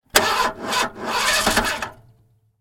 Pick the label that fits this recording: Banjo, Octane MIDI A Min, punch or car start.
car start